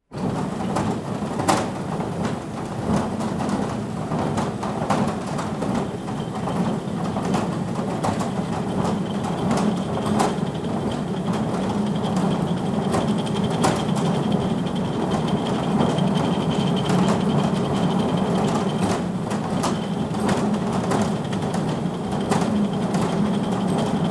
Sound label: Huge Dough Mixer running